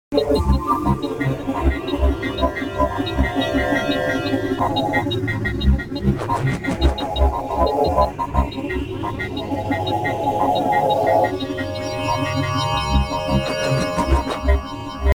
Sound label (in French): Robots Jingle